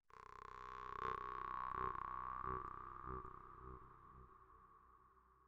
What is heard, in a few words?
Vargan